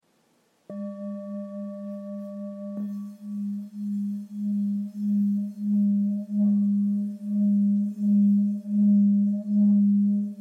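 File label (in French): Bol full moon 690g sol dièse, Bol chantant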